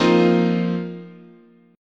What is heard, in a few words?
F 7th Suspended 4th, chord